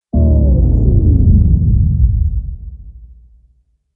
Slew Hit Downer

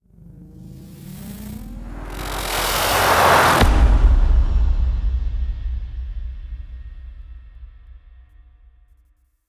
Anxious Drop; Gothic Storm Music; Brain Warp (Sound Design Trailer Tools) 2011

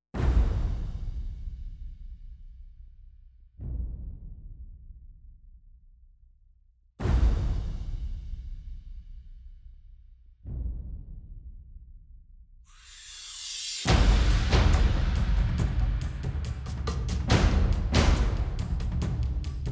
Perc Only; Brand X Music

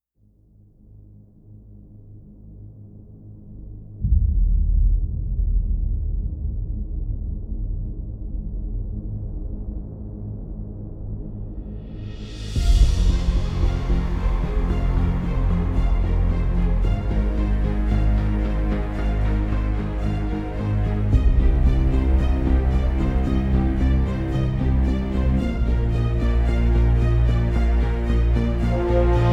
No Choir

